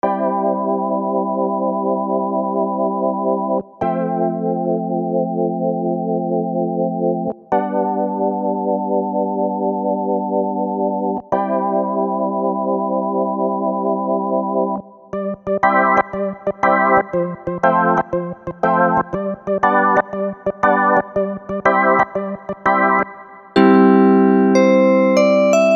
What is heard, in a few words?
organ